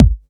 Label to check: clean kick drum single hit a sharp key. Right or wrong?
Right